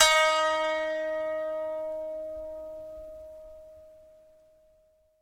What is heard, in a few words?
koto; Summer Catchers